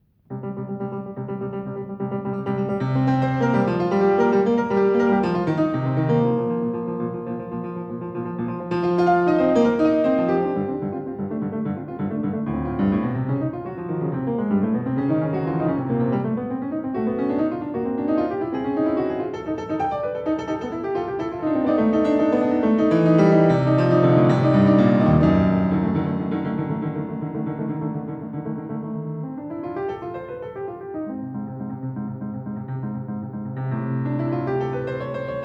Dances in Bulgarian Rhythm; Mikrokosmos, Sz. 107 Vol. 6 6 Dances in Bulgarian Rhythm I, No. 149

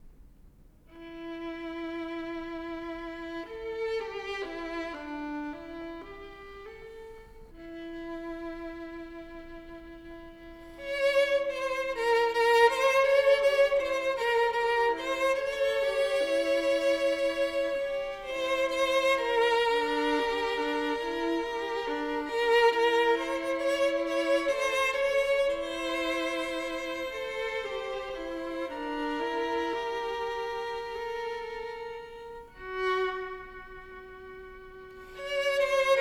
Duos for Two Violins, Cradle Song

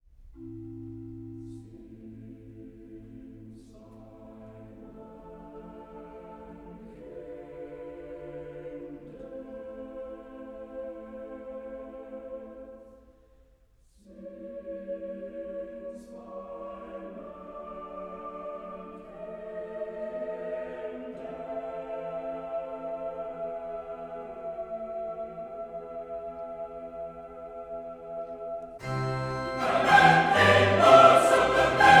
Chorus; Since By Man Came Death; Sir Neville Marriner; Handel Messiah

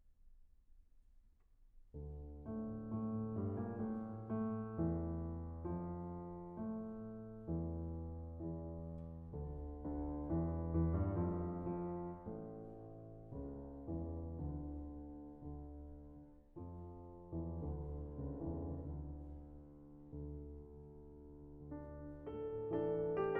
Lullaby, Piano; Norwegian Folk Songs, Op. 66; Grieg

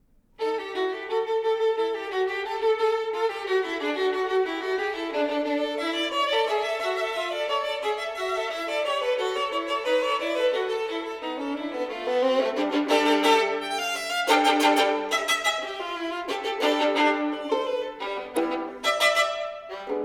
Duos for Two Violins, Scherzo

